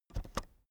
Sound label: keyboard external space press slow soft